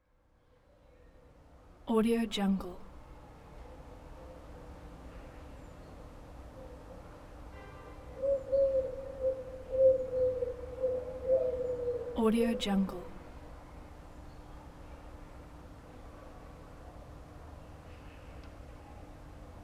peaceful afternoon ambience with doves calling and children p; peaceful afternoon ambience with doves calling and children playing